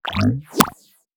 Grab Spitter Fire; gamerip

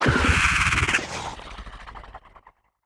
Damaged Long; Fellwing

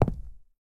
Footsteps Concrete Shoes; FX Oneshot Footsteps; StarBites

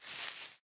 Thick grass

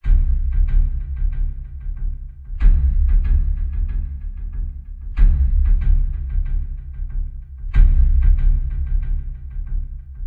Lion Beat

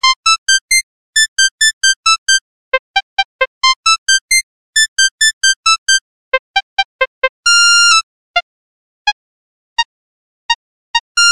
Ring Tone (Mono); Smeshariki Kaleidoscope of Games

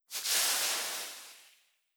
Nature

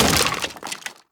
Building Particle Falls On Floor

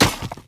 Stone Sword Hit

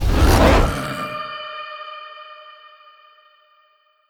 Elementaliste Wolf

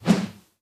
Moss Knight Sword

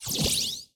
Freeze; rabbids multiverse; iOS, macOS, Mobile

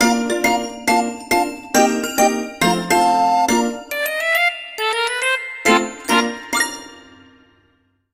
Blast Failed Bgm

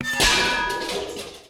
Drinking Fountain; rabbids multiverse; iOS, macOS, Mobile

